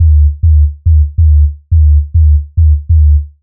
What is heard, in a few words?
140 D BASSLINE, Dubstep